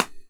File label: soft hit normal